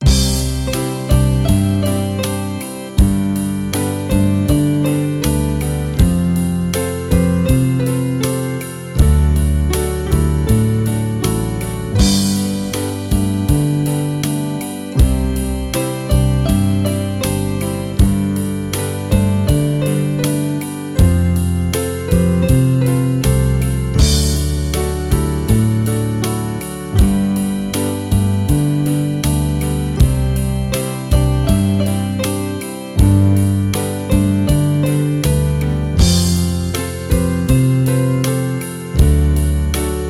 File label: Pathways Theme Acoustic Guitar